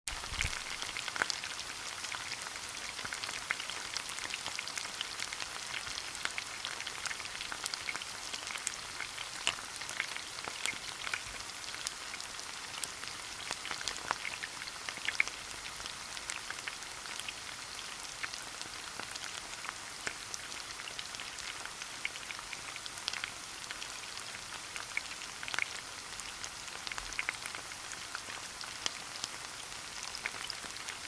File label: sizzling